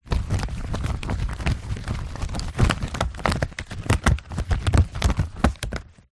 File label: sport swimming cap latex handling